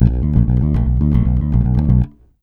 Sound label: RUMBLE, Bass, 112 BPM; Sampleheads